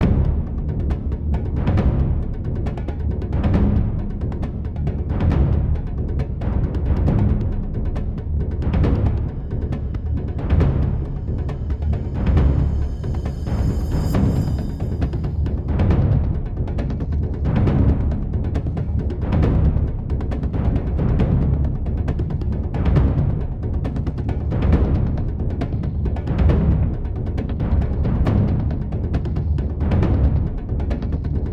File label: Percussion Loop